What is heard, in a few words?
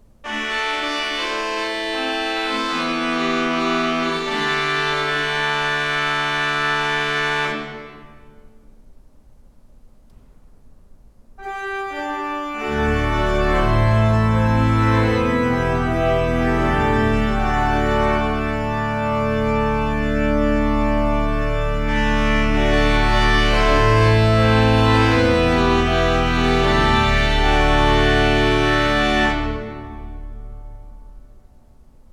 trompette en chamade chorus, organs